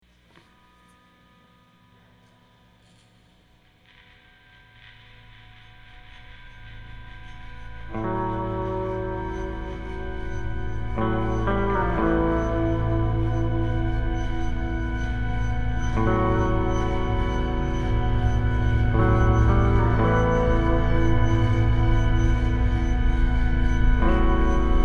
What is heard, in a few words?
Eerie Guitar Instrumental